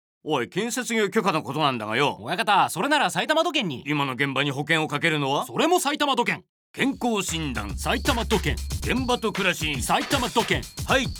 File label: rap